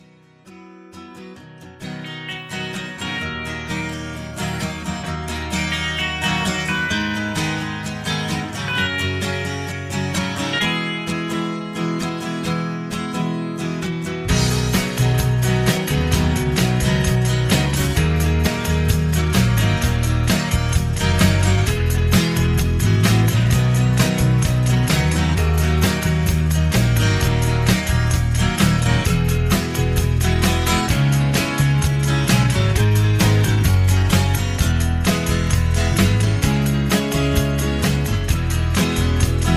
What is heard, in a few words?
Instrumental Lower